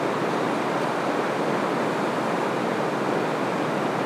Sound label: wind outdoors; ambient